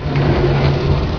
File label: push box, debris